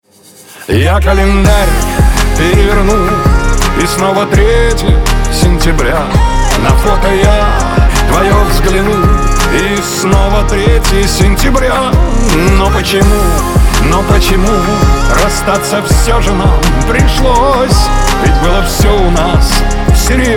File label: 26-29hz